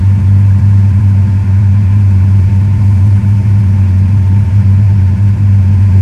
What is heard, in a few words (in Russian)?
simfoniya kosmicheskogo trujenika, zvuk lunohoda